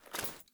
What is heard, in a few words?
Foley Run, Clothing; Characters; Player